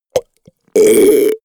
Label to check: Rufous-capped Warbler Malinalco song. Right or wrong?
Wrong